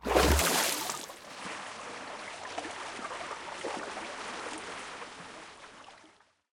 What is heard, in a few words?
exit, underwater